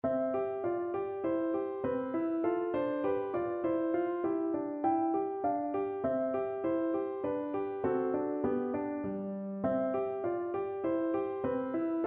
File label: schumann melody, piano